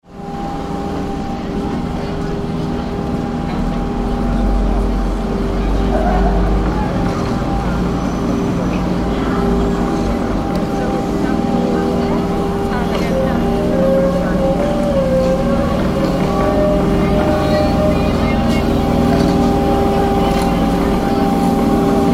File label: Street Cleaner, Modern hand held road sweeper moving past in bust City ambiance; Cities; Backgrounds